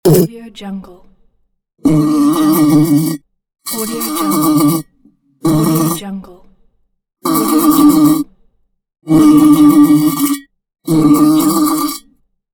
fly

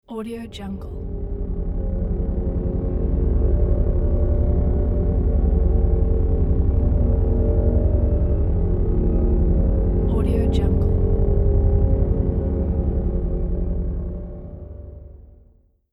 mysterious drone

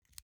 object electronics insert xlr extension quick

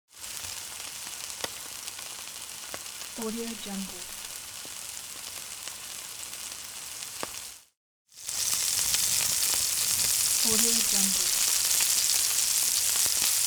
sizzling food; pure-noise

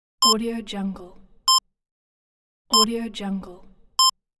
intro timer

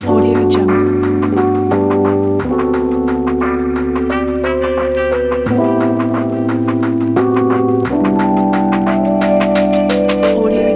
a lofi hiphop

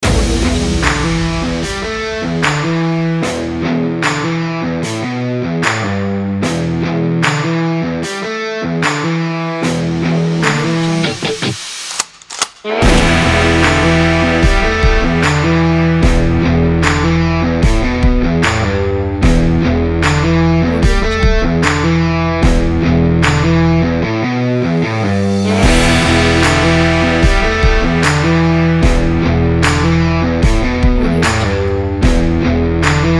western

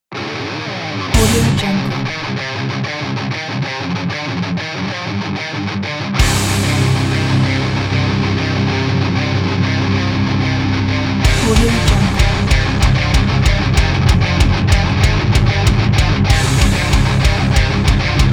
energetic metal

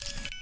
camera point shoot

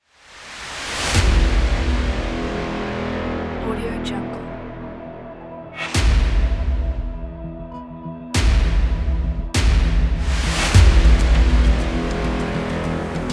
for trailer

